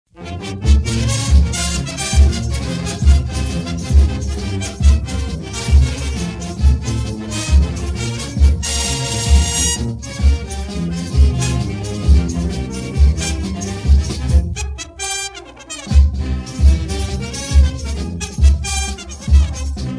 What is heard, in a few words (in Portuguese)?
Frevo